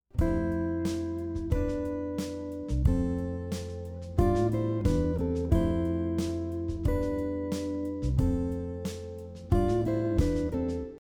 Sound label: 6th above melody example, guitar; how to harmonize a melody on guitar using 3rds and 6ths